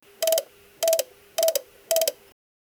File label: cuckoo clock sound